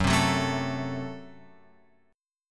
F sharp Diminished; F#dim Chord {2 3 4 2 X 5} strummed

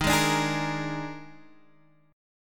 D sharp Major 7th Suspended 4th D sharp, chord

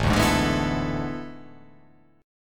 A Major 7th Suspended 2nd Suspended 4th

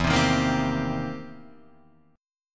C Minor 7th E; strummed

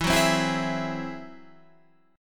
G sharp Augmented 7th, chord

